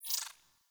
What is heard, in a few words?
UI Default Theme Window Open